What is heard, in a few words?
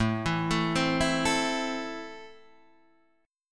E Suspended 4th Sharp 5th A